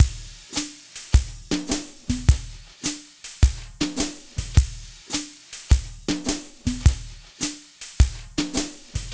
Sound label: Multi Gt Swing Drumz, 105; Zero-G Kocktail Kollection; Construction Kitz (Lounge)